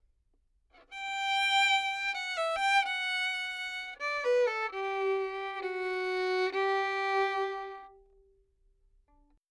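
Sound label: Dry, 3-4, G major, 128 bpm, melody, violin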